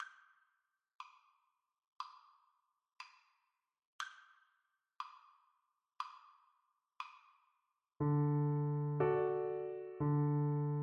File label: double bass